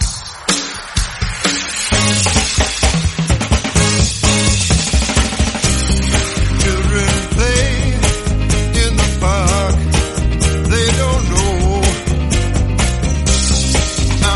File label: Slam End 125bpm Eb Slam end REDRUM